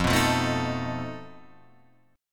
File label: F 7th Flat 5th F down